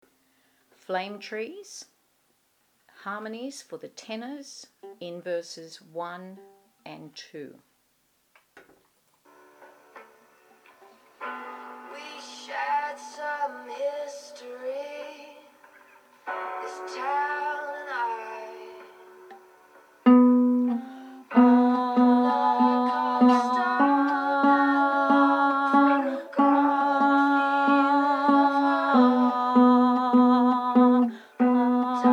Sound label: Tenor